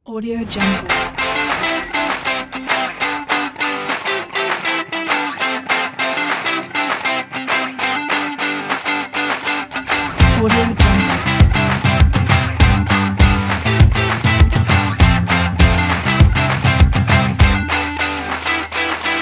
happy indie pop rock

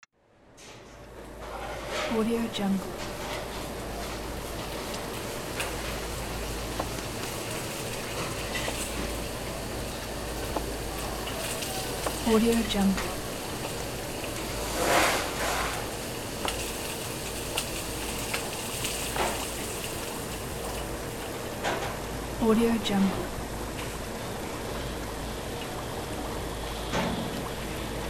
restaurant kitchen ambience

